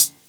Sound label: HH, HIHAT HIP, Dance Mega Drums; 90 s Sample CDs; Best Service